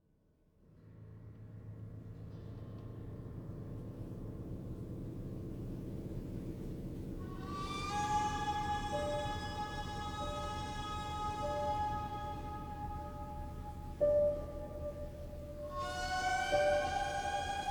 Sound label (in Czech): housle a klavir